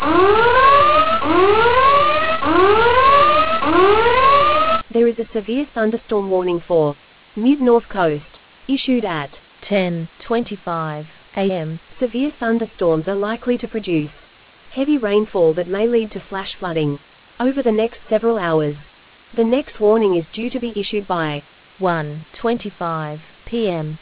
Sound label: storm audio